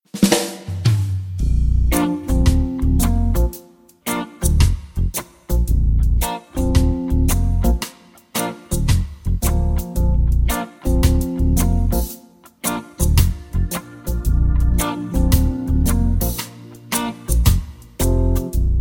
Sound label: (Bb) No Backing Vocals